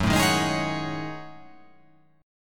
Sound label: F sharp Minor 6th Add 9th